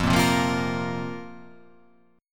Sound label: F Suspended 4th F